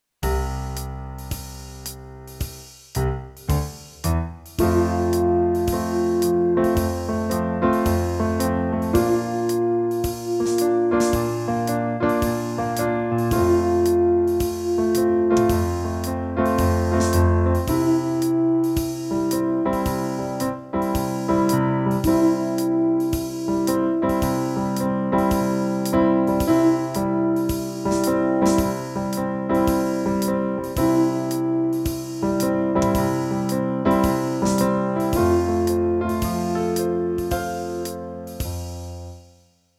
in Bb mid F low, brass

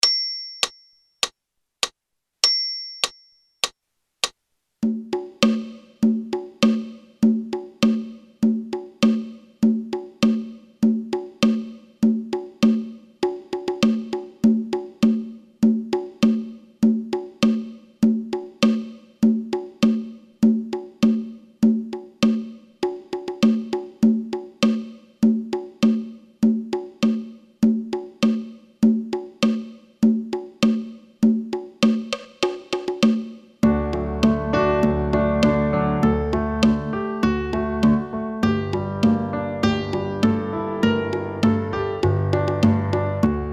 Minor Mystery Perc with count

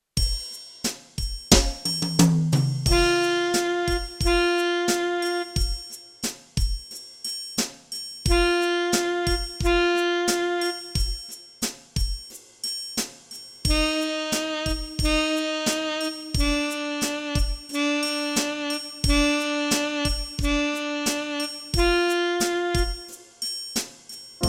Walking, brass

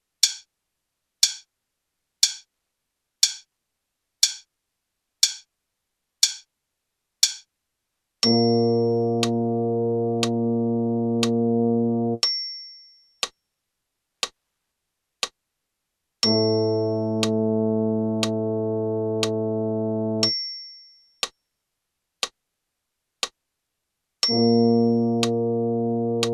Find in Bb, brass

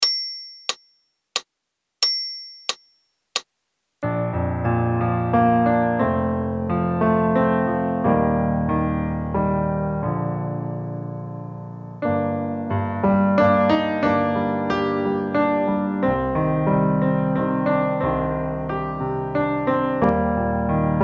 in F Slow waltz count in, brass, practice

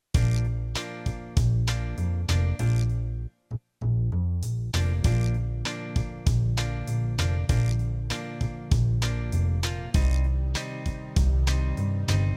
Sight reading, brass

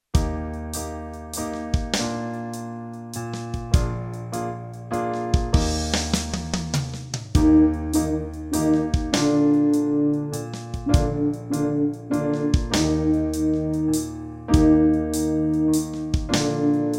Eb low, practice

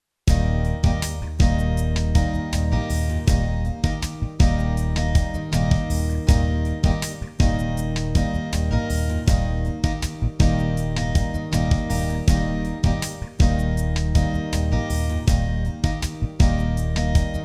Improv, brass; backing tracks

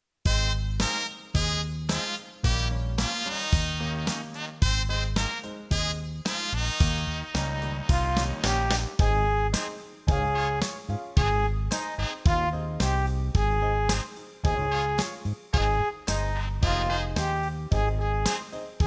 Tutti, brass; backing tracks

